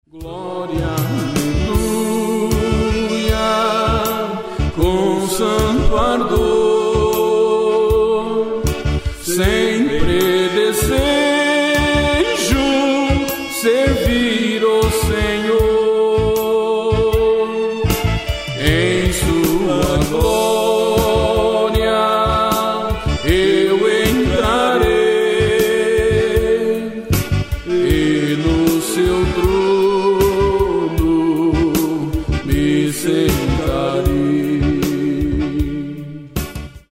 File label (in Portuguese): Hino, Glória, Aleluia! sinto Jesus